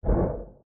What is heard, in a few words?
enderman teleport; Minetest; VoxeLibre; mobs mc